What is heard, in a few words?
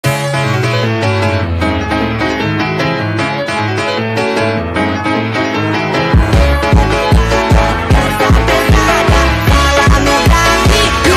Phonk MONTAGEM